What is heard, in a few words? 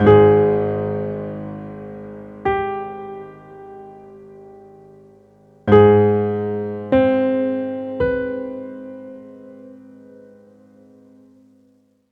Theme Piano; The Darkness 2 gamerip 2012